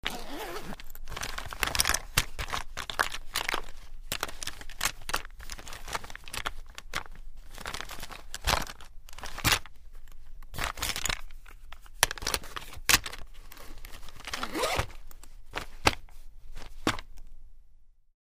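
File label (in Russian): косметичку расстегивают копошатся застегивают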